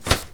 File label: shield hit; Combat